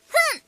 polka

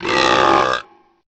cranky burp

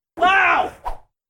notification sound follower

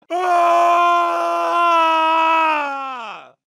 scream; mk9; kenshi

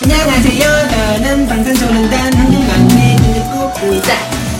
fanchant

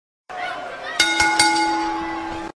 bell ring sound effects; wwe

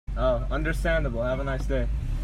eeeeeeeeeee

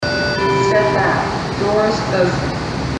step back door closing

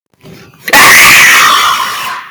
peaceful sounds

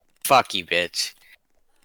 slimy poop

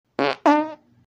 fart; buttcrack

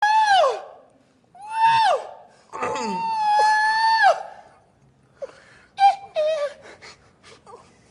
hihi

